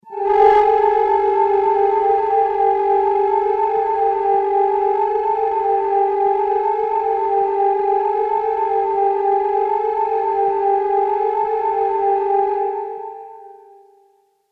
Horror drone, Horror Drone: Discordant, Eerie, Grating; Scary Tones; Stuart Duffield